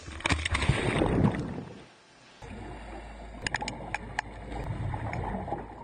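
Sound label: sound of underwater dive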